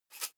herbs shake dull single; soundpool

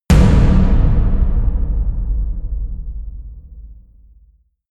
huge tom hits; music elements; percussion

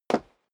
step sneaker wood parquet single stair up; schritte

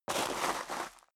step high heels gravel medium slide long; schritte; Footsteps

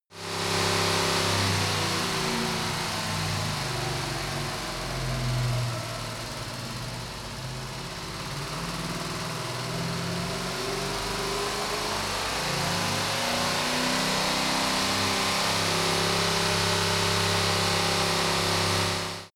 car camaro decelerate accelerate engine

